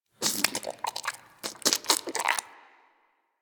Goop Squeeze Close; Ambience; Warframe; Infested Eris

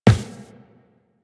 Run Default, Footsteps; Warframe; Tenno

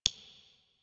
Messenger Hover; Ambience; Warframe; Nineteen Ninety Nine

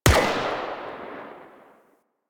UMP Shot; Warframe; Venus; Venus Flying Spaceman Agent; Corpus

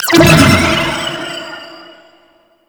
Ice Aura; Attacks; Warframe; Hyena

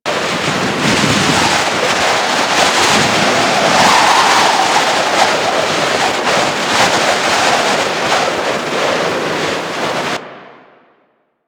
Forest Fan Dust Loop; Ambience; Warframe; Grineer Forest

